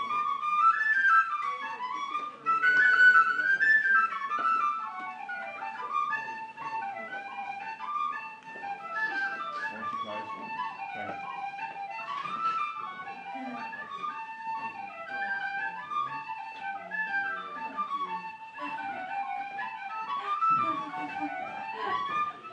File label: playing the tin whistle